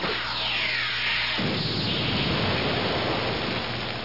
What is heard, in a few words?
Phaser